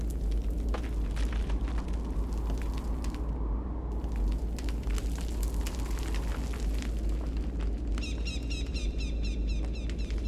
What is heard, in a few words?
Amb Dojo Mountain; Fruit Ninja; Android iOS mobile gamerip 2010